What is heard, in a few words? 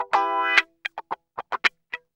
GUI, 110 BPM